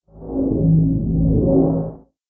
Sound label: cloak